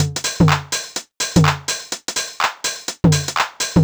drum hurtme top 125, Drum Loops, Classic Chicago House